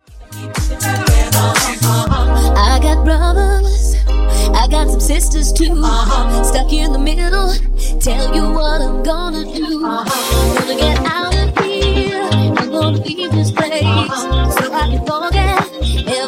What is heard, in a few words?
Disko Funk Bootleg 120Bpm